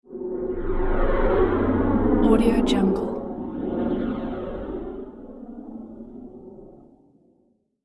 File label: dark jet whoosh; sound-ideas